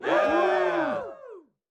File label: audience chears variation